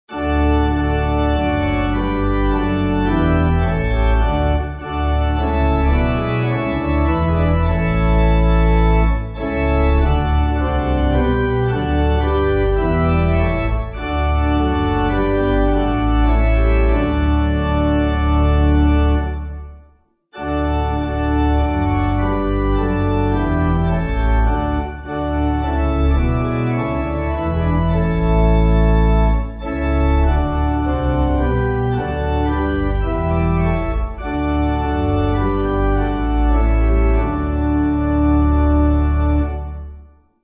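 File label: pipe organ, Music Backing Files for Churches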